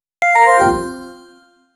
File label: achievement